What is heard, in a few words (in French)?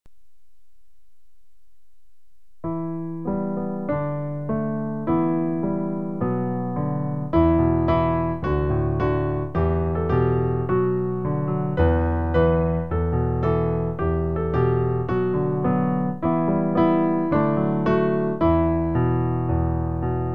piano